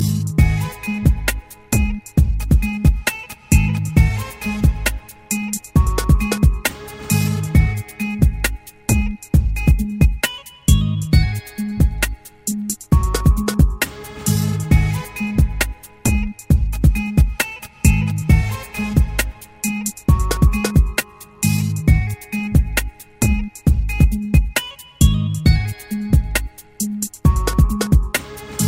Beat

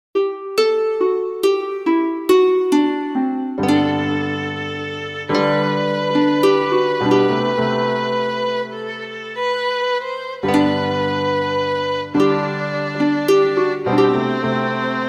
Slow Ringtone

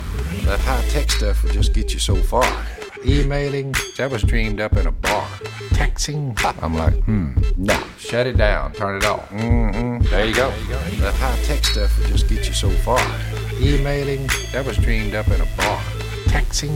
DUCK RAP

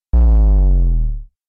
Scroll

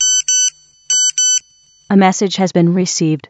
Msg Receive

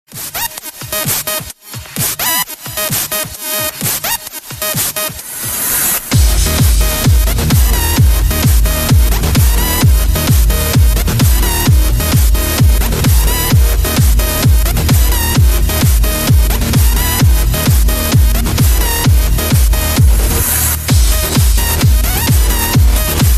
Great Mega Bass

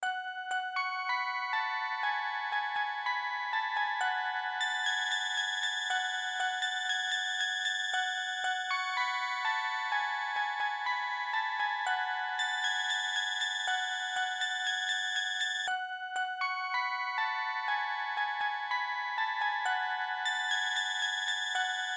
marimba

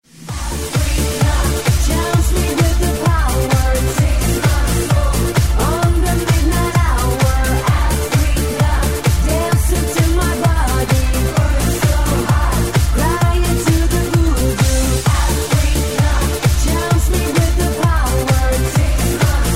Africa Dance Mix

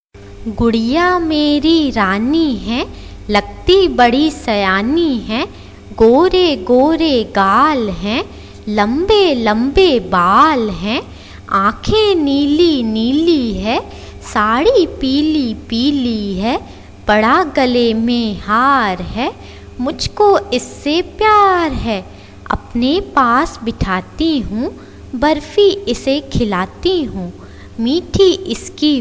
Child Rhyems

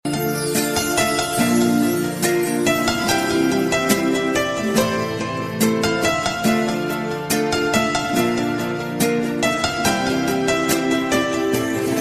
Flowing

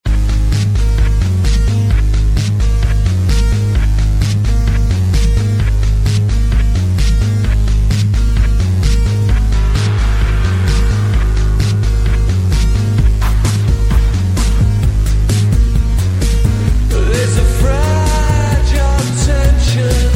Tension Fragile

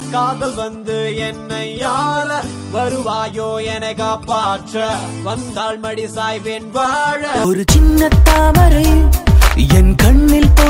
Mix Tamil Sngs

